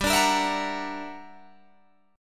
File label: G Minor Major 13th, G, Guitar, DADGAD